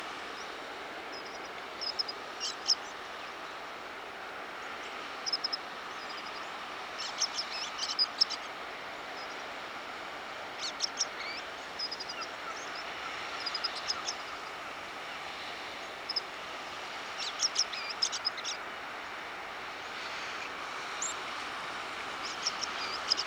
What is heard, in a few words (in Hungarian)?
erdei szürkebegy hangja